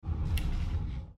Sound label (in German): dialogoption anwaehlen uisound; divinity original sin ii